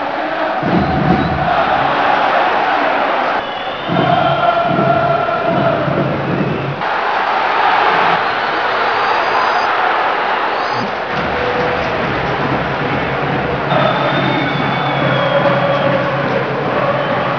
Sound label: crowd; stadiumgsu